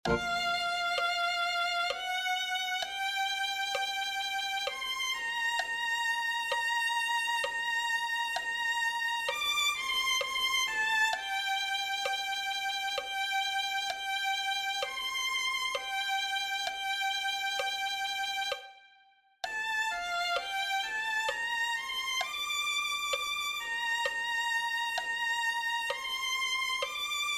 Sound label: violin